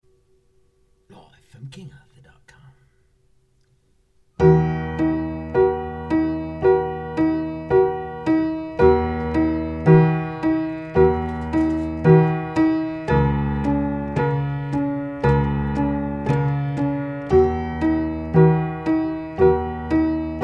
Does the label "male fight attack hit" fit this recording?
No